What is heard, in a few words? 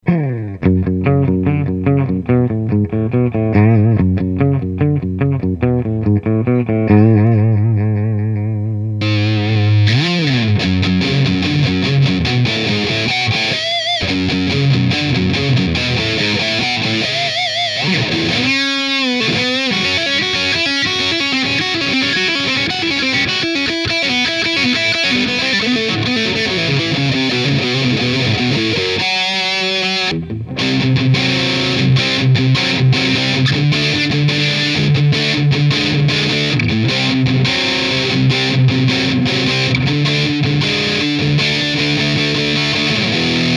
Test Crusher; BBE